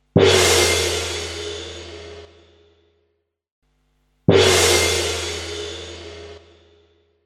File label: gong